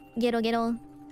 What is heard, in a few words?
gero gero